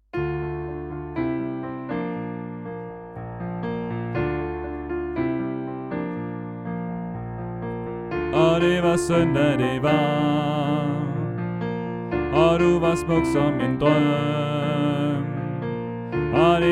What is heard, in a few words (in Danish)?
Eventyr for begyndere Alt; oevefiler